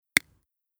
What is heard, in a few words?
scale